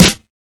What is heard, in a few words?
old school subtle reverb steel snare drum b key; Duration 0:01; Samplerate 44100 Hz; Channels Mono